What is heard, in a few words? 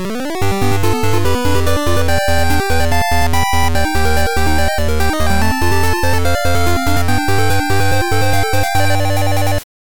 gem get